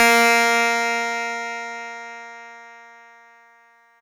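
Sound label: Fuzz Clav DX100 A#1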